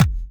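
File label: snare drum bass drum, Dance Mega Drums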